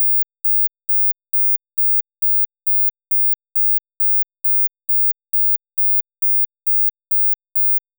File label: 20kHz, mosquitone